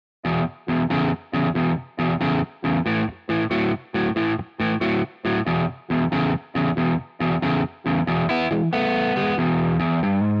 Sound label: guitar pattern 2 gt